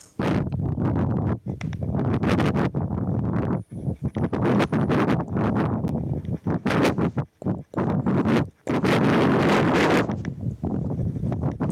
wind sound

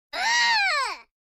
ugh; sky; aurgh

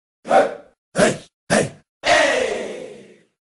hip hop hey samples for music production

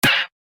hurt parrot

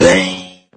wand item; item asylum

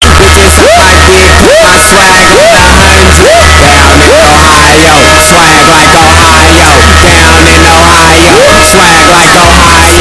loud; ohio

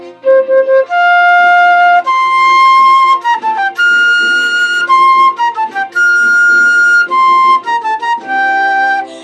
theme song on flute; star wars

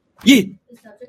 yeaa

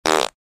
short fart

